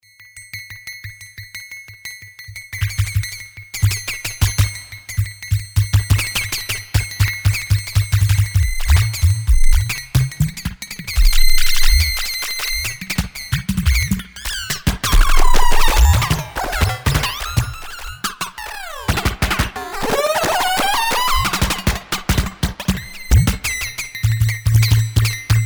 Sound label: drum